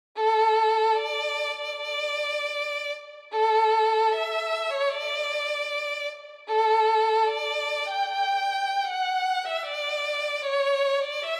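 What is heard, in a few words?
solo violin; Hochzeitsmarsch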